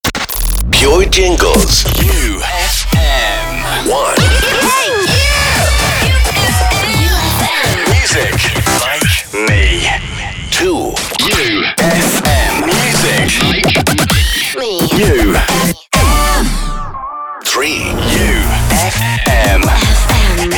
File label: jingles; pure; you fm